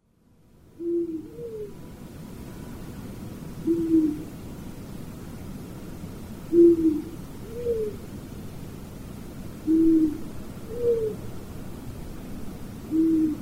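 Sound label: long-eared owl, tendo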